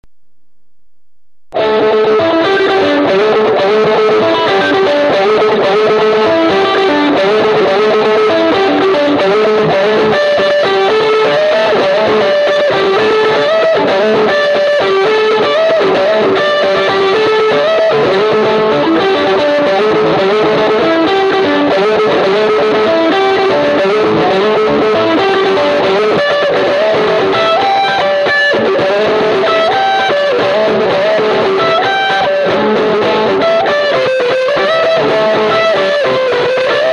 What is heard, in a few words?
G Fuzz H Grail